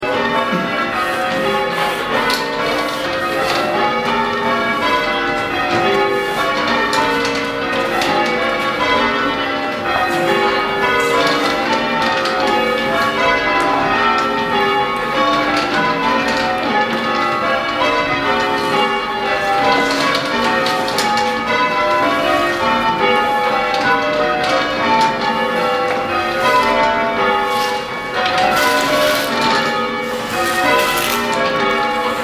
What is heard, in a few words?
Bells